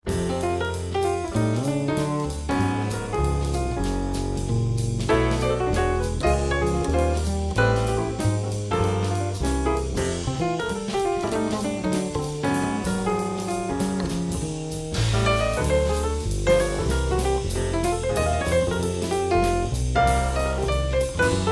jazz dancing

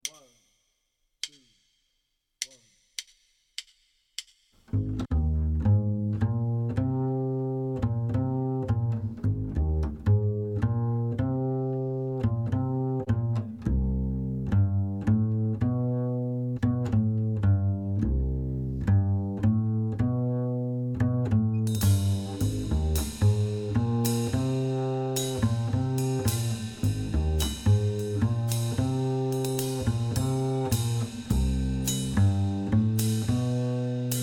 Bass drums only

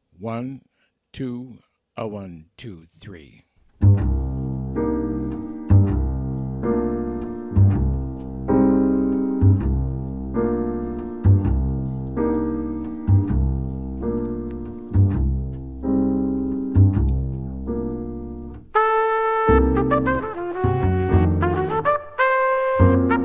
minus drums; sticks throughout - there is a separate drum part; count off sets up the intro

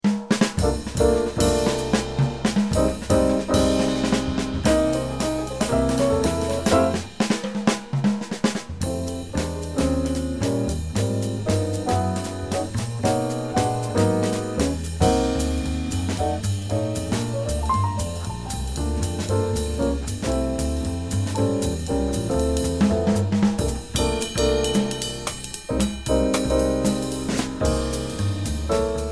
minus melody

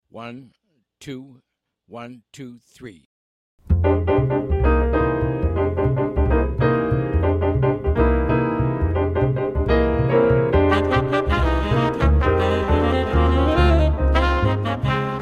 minus drums

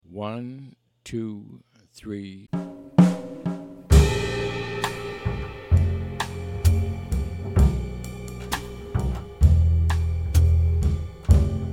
bass and drums only

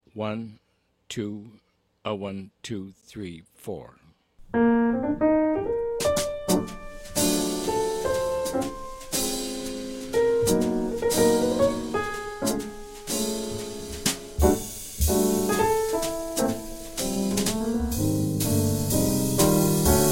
minus bass

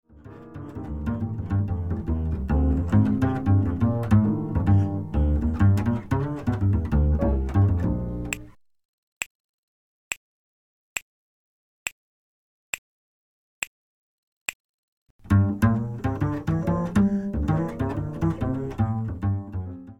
drum bass exchanges

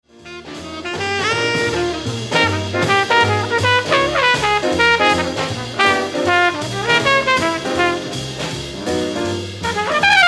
solo

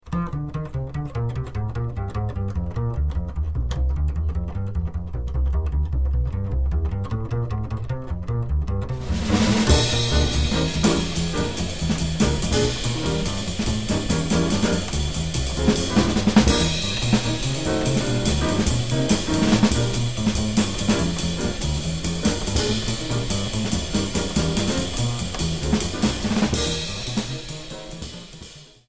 minus melody

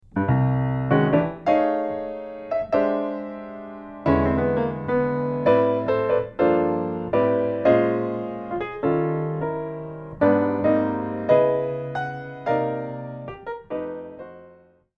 Solo Piano

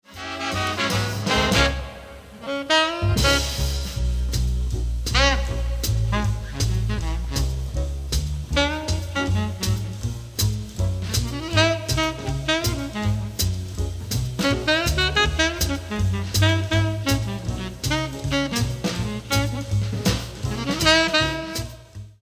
solo

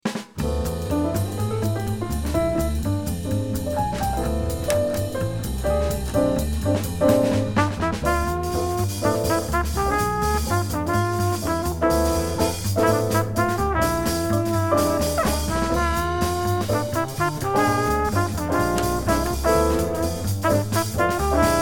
complete mix

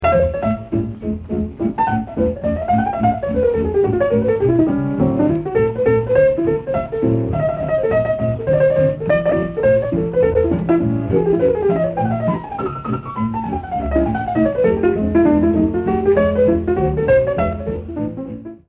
solo

